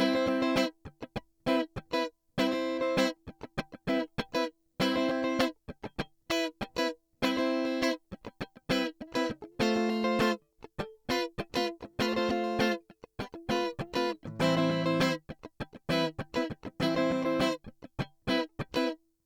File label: Guitar Rythm Highshelf -10dB 4000Hz